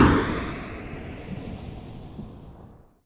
Impacts

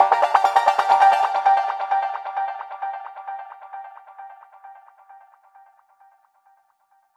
Synth Shots; Vengeance Samples

